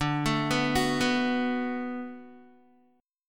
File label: D 6th D down Guitar, chord; D6 arpeggiated; tuning 4F,4A,5C,5G,5C,6E